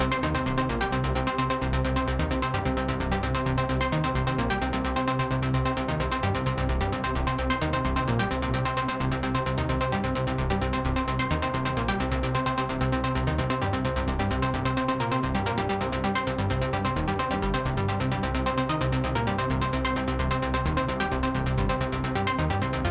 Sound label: Bossbattle Layer 130bpm